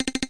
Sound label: Sys Talk Mid; The Denpa Men; Android